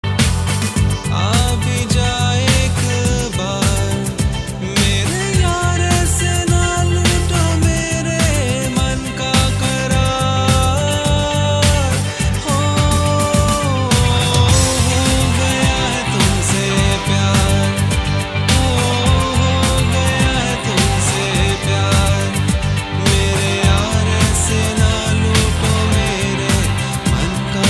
Strings

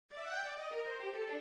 Ring Leitmotiv, mid

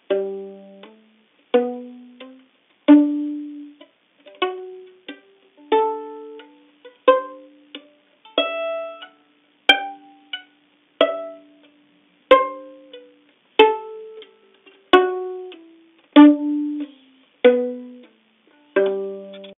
Pizz

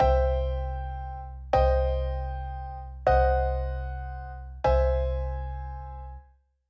C Cm Cdim Caug

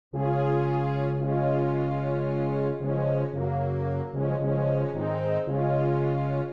Ring Leitmotiv, mid

